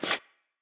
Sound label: DROP MENU; pandemo